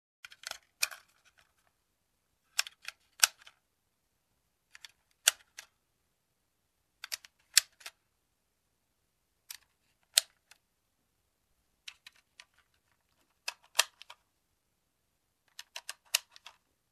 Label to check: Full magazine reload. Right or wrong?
Right